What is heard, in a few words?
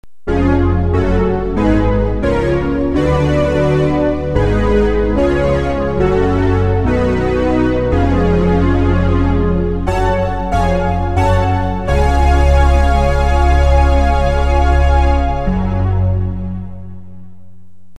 juno106